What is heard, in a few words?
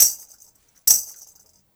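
TAMB, tambourine; Drumdrops In Dub VOL-1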